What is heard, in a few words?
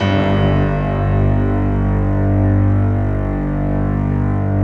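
G 1, PIANO STRINGS; Best Service; Advanced Media Trax Modern Composer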